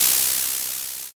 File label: soda